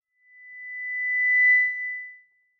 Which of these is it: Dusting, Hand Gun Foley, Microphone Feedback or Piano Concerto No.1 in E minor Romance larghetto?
Microphone Feedback